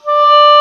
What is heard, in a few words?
Oboe mf D4; Digital Sound Factory Virtuoso